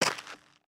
Foot Dirt Sneaker Walk, footsteps

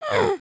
indignant, emotes

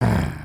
Walk, creatures organic

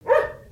Pet Terrier Bark; creatures pets

